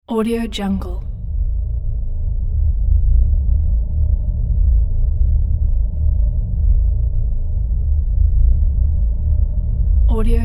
low eerie drone; sound ideas

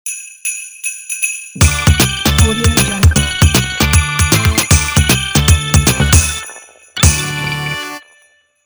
arcade christmas logo ident

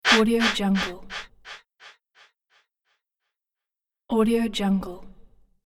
whoosh delay pulse swoosh

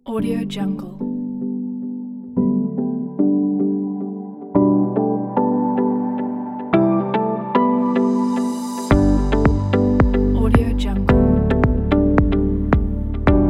curiosity; soundtouch

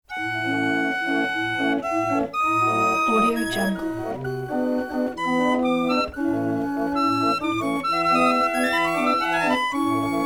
barrel organ constant playing happy old tune

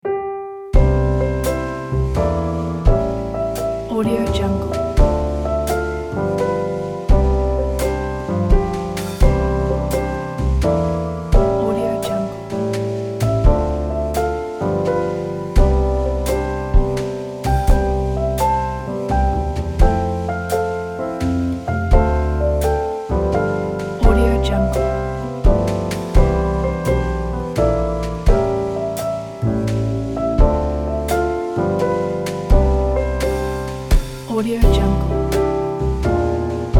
o christmas tree jazz

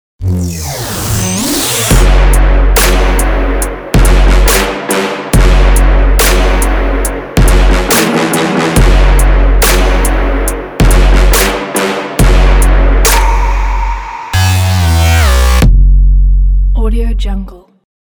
trap logo

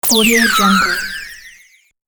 chooo noise riser lead in fx

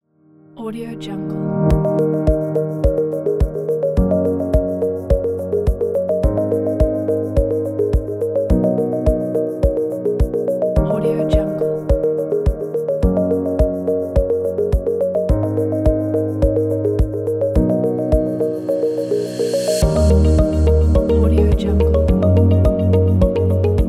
technology ambient background

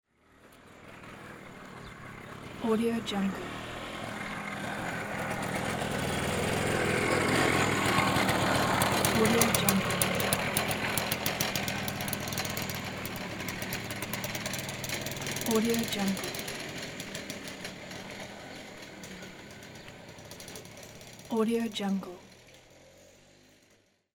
motorcycle

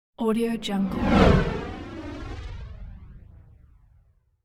passing space whoosh; hollywoodedge

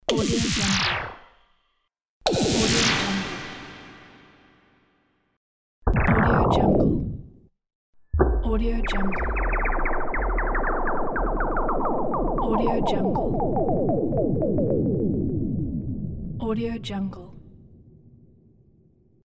teleportation; mrsoundfx

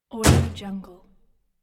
car hood close; wistansound

